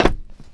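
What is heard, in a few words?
player metal, footsteps; csgom; cstrike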